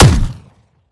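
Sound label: weapon player; romeo870; gmod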